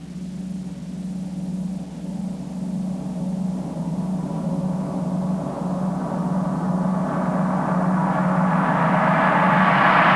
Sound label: meteor, space